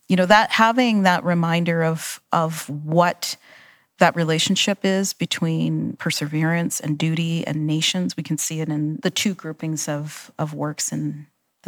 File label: horns